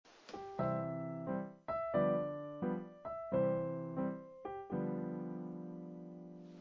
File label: jazz